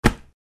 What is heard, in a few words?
Catching a rugby ball